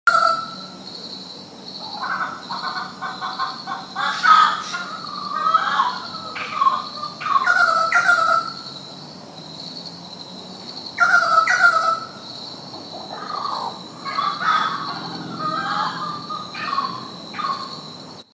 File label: scrubfowl